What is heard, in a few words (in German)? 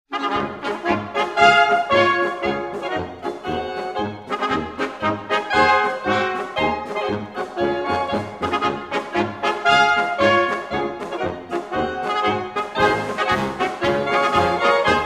Doerfliche Blasmusik; Kiem Pauli